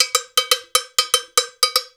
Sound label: World Dance